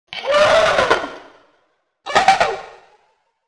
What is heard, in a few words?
Dinosaur Call